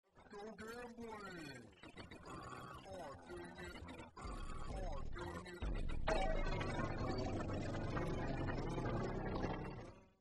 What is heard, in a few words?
lets go gambling phonk